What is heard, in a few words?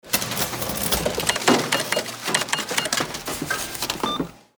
Commercial Bldg; simcity